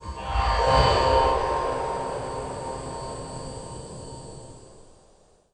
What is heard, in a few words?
Mountain Guardian Out Of Ground DISTANT; Journey